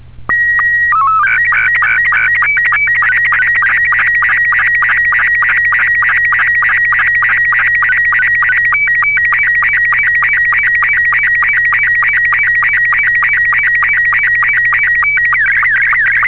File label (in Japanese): SSTV, ロボット72秒